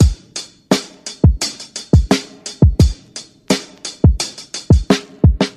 Drum loops Boombap

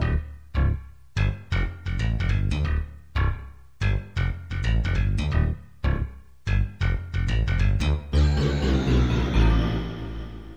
bass, Loops Phonky

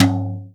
Toms

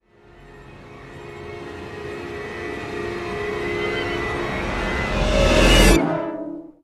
dissonance

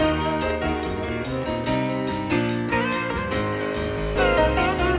piano and guitar, Phonky loops

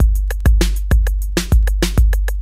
robotical, Drum loops Electro

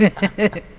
laugh

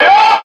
scream

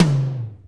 chromatic, Toms; Real

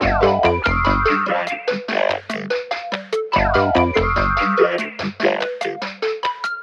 breakdancing robot, loops electronic

